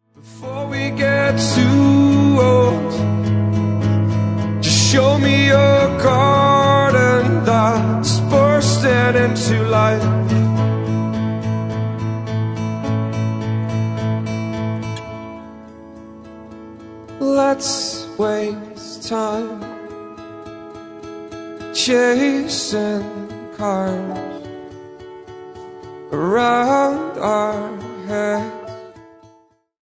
(acoustic)

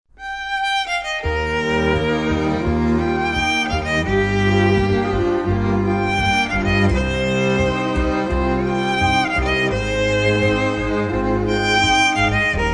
String Quartet

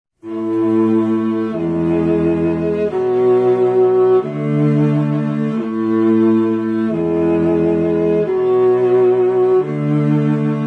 String Quartet

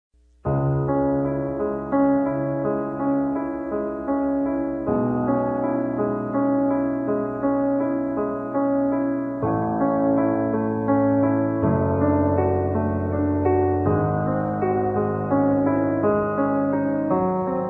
Moonlight Sonata (piano)

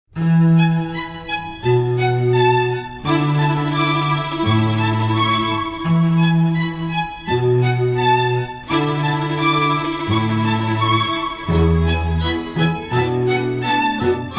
String Quartet

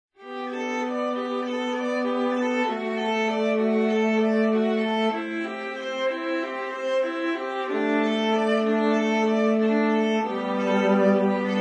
String Quartet

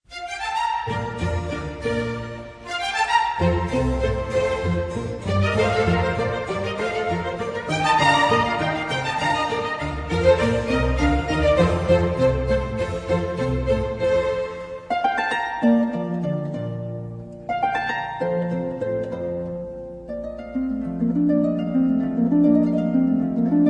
Harp Concerto In B Flat Major

